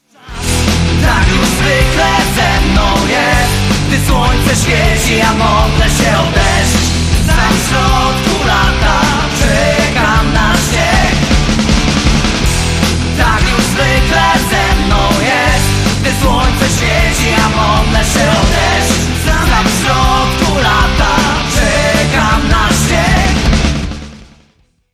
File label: guitar